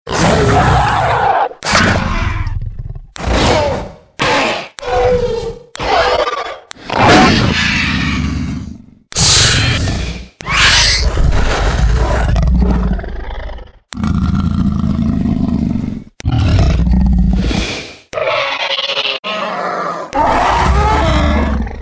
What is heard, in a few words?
Velociraptor